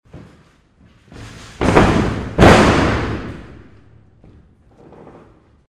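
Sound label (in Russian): в спортивном зале падает борец; Звуки падения человека; на ковер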